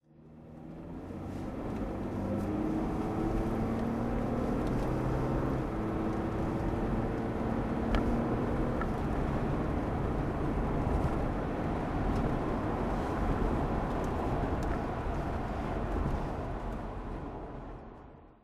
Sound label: jaguar XJS 40 coupe driving